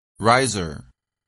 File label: riser